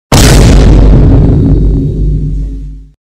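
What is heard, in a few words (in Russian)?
громкий бум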